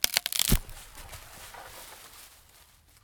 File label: wood fall; Zomboid 41.78.16; Project Zomboid